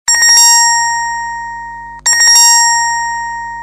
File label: vertu, vertu classic melody as ringtone